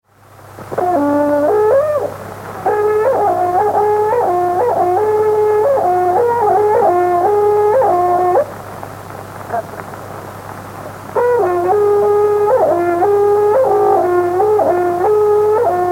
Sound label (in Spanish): Toque de trutruka; Puede ser en Boroa, Collico o Dollinco; Mapuche